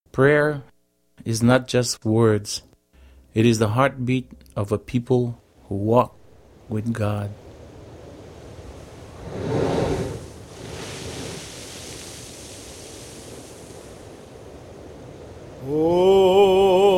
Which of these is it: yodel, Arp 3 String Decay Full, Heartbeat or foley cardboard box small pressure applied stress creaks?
Heartbeat